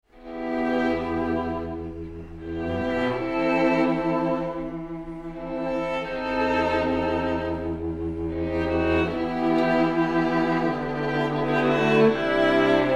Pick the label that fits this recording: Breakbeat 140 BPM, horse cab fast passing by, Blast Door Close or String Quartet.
String Quartet